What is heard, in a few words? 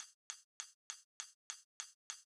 clicks and hi hat